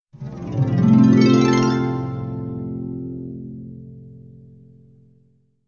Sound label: Harp Sms; nhac chuong; nhac tin nhan